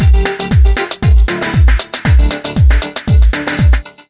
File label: Elect; Google; NewWave Labs